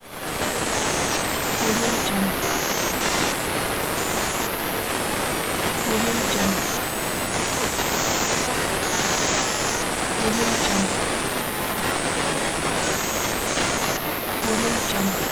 digital interference

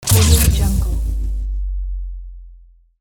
electrical shot

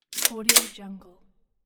gun loading

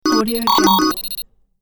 small useful glitch

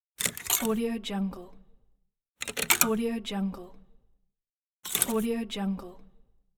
cabinet hangers

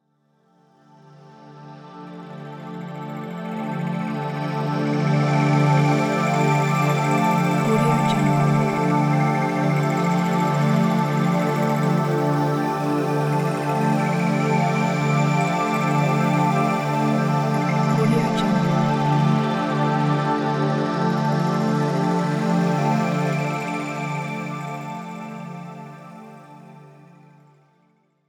deep space ambient

